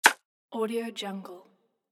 slicing; bogdanbogdanov

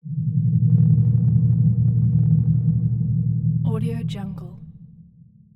low rumble

